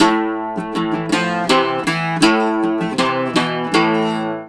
Celtic Flavours; USB Soundscan vol.42; AKAI

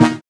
SYN, dance; Sound & Vision - Gigapack II

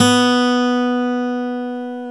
GTR B3D, Dual Guitar, Ac 12+; Club-50 - Foundations

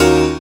piano, d#, Techno Piano Chords; Dance Synths

